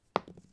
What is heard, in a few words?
dice Throw, Casino; Kenney's Sound Pack